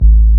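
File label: Bass Lowpass, Dance Bass; Sound & Vision - Gigapack II CD 1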